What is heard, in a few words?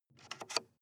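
sports car interior ventilation adjust slide soft; verkehr transport; autos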